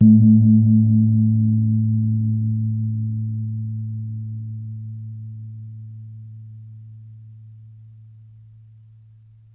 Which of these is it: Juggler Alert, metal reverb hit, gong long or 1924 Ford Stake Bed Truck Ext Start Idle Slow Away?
gong long